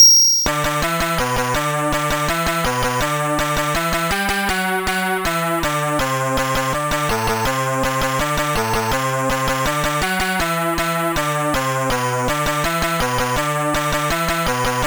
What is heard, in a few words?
Music Box